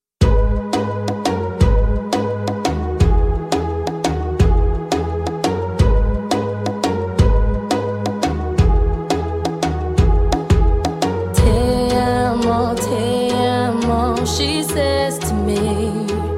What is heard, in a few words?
teamo duet